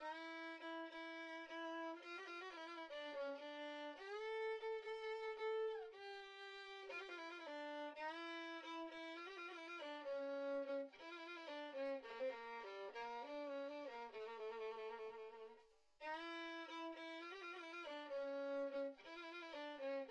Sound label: Cello Dog